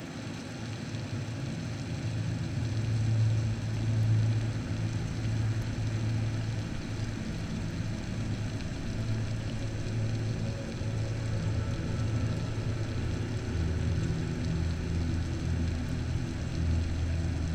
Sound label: Calming Rains; Brown Noise